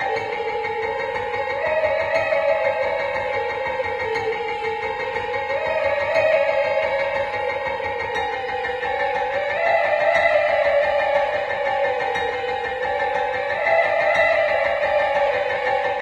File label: witch encroacher, music; left4dead; Source engine